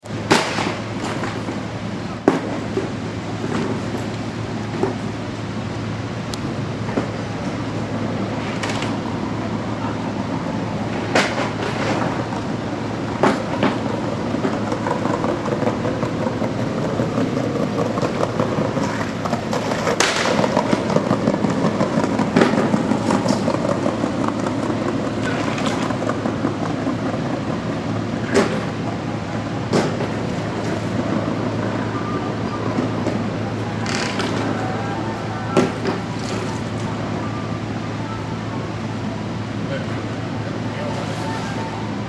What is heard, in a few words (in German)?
klang schlossplatz